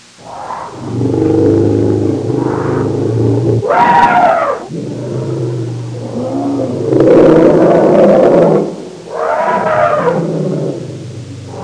Growling jungle felines and elephant noises

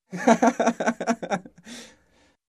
Man Laughing

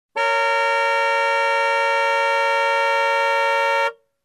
Car horn long

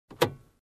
Car Interior Compartment Open

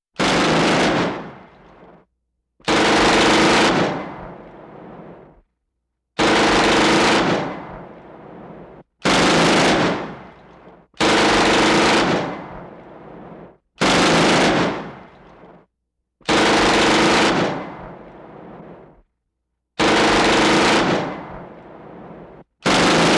Machine Gun Sound

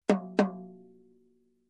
High Tom Hit twice

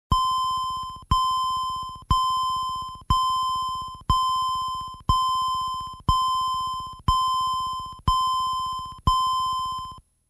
Car Door Open Warning

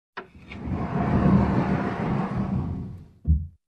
Sliding Wooden Doors

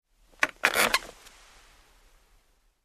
Single chair creak

